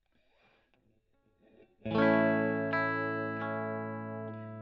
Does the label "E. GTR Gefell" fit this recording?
Yes